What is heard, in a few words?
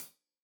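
hat closed, hi-hat cymbals stereo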